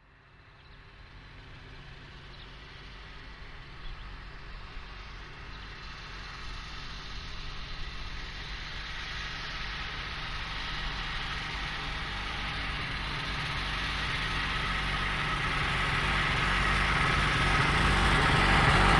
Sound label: Snowmobile passing by